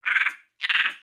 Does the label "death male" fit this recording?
No